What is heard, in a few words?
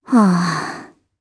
Sigh; Cecilia; kingsraid